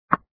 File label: Ball Tennis Bounce